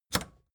record player power click on fast

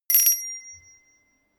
melodic tiny bells

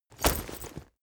leather metal impact chink

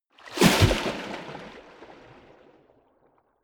waves splash hard

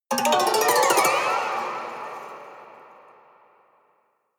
haunted strings weird stroke

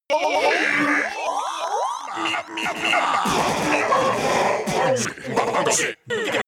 vocal glitch

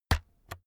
keyboard external key hit slow

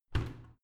washing machine modern close

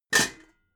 pots hit

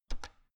oven button press

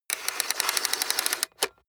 plastic mechanical draw rattle lock

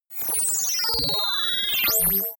interface calculate

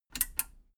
metal button radio click power on slow

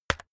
keyboard external key hit very fast

